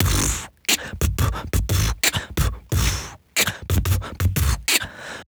90 RnB, Human Beat Box; Loopmasters

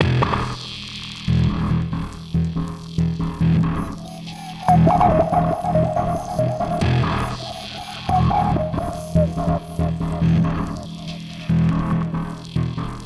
Seq Loop Em; Bpm141; Serotonine; Dubstep

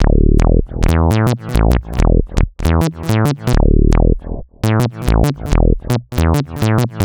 Bpm136 Locked Bass Loop D, Dubstep; Prime Loops; Dubstep Illusions

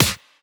Claps & Snares

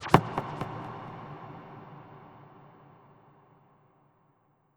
FX Impact

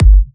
Bassdrum

